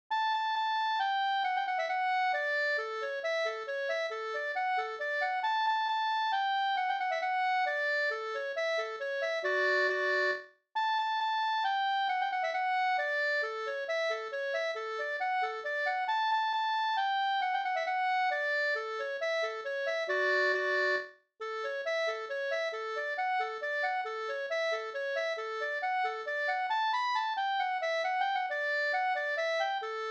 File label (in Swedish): mazurka; Mazurka av Anna Norman